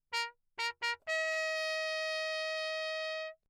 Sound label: TRP MUTE Bach signal; Engemann; Perinétventiltrompete; Mutes